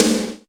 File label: snare drum sound a sharp key